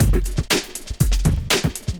DRUMS, 120 BPM

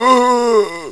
zombie hit; toyspace

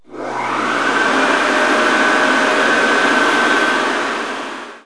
Hair Dryer; Household; Bathroom

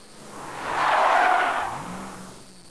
driving; Elaina; DR. BRAIN

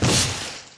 dig; PHARAOH

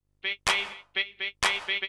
PERCLOOP HOUSE 125; eJay

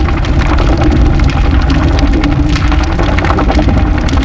volcano; The Rift

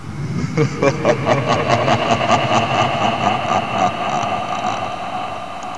Evil Laugh; Halloween; Adoption MacroWav Library

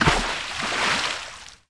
Fencing Splash; Pirates Of Treasure Island

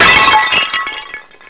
pottery; KABOOM! - Wild and Wacky Sound Software

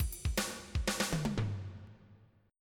swing, drum; Sounds Terrific II; Weird Science

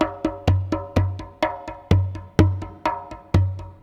PERC LOOP ETHNO 125; eJay

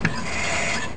AIR IN; rrdemo

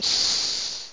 splash; worms dc; tykes

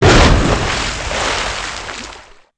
water hit; pcdemo

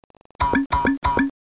walk; McDonaldland - Silly Games; McDonald's; snakes

